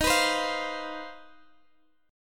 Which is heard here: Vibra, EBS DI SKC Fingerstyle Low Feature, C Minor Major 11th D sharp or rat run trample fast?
C Minor Major 11th D sharp